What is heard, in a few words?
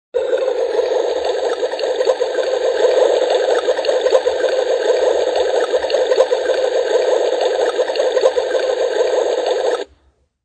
Toy Boiling, Toy kitchen boiling sound effect.; Children; Human